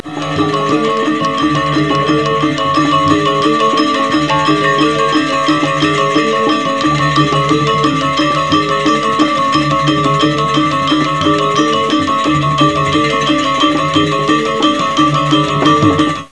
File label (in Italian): suonerie musica ritmica